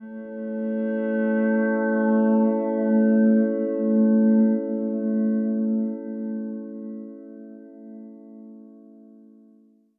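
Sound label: ambience glass hum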